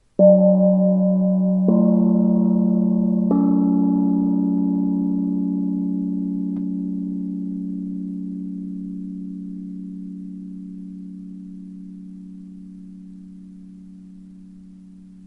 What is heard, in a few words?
Gong